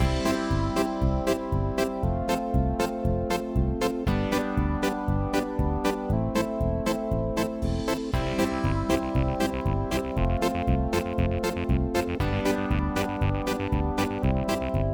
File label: Looping, chiptunes